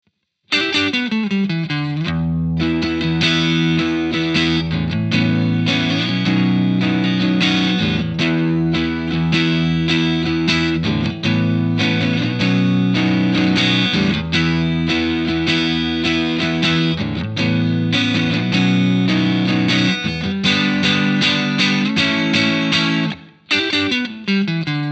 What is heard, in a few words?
characteristic sound; jensentone